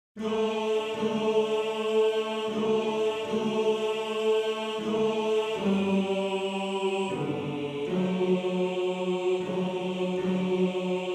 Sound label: Bass; Bass 1